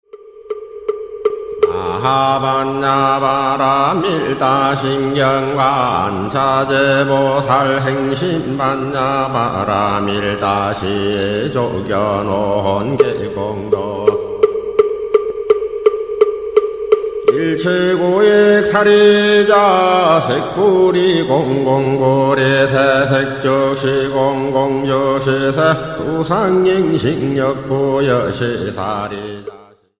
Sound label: ringtone